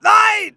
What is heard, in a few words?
hit, pain; mohaa